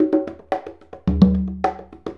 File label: Congas; Essential Percussion